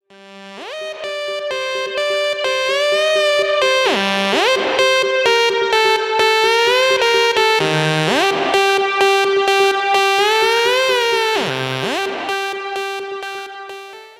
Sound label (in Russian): sms soobsenie